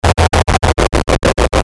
Chopper Static Ascend Descend; Blastwave; Chopper Sound Effects

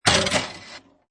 Pinball Sound Big Spring With Ball Roll; Video Games

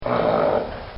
Horse Grunt; Horses; Animals; Bobby Cole

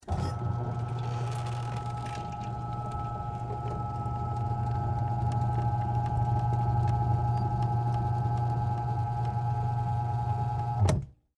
Electric Gate Close; Gates; BlastWave

